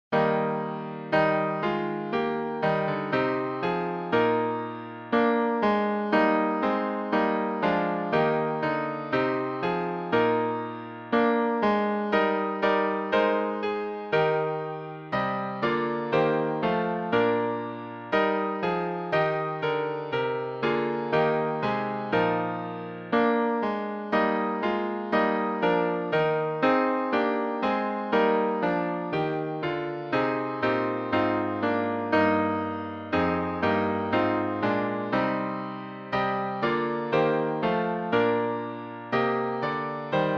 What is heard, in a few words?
Hymns